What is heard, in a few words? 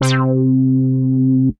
Synth Brass; Poli; Juicy Fruit Texas